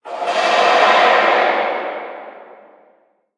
WrkShop Press

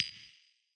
Mouse rotation

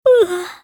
Lazy Yawn; F.A.M.P.S

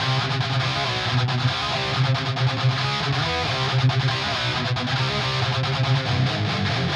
Drums and Jingles Battle Guitars Riff Loop

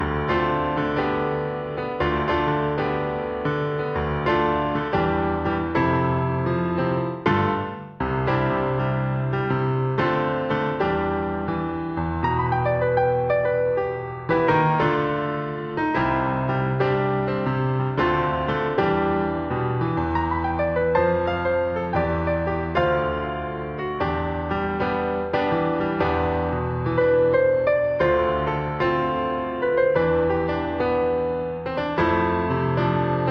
Morocco Piano

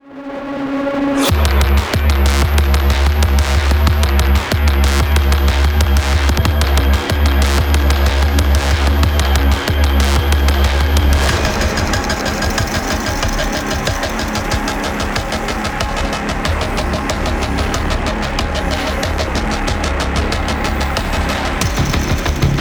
start 186bpm; Doom

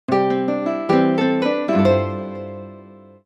Music Menu event

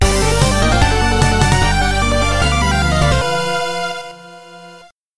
Power; Dracula's Shadow

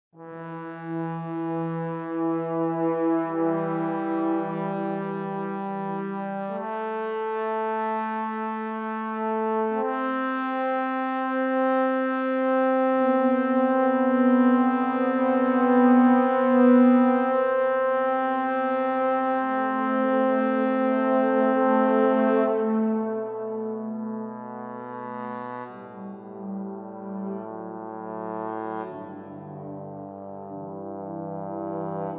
Camp Brass A

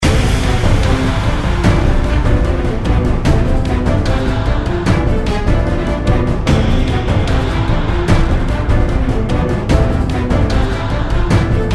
Raid Boss; mist train girls android ios mobile gamerip 2020

